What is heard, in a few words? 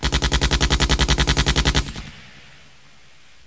weapon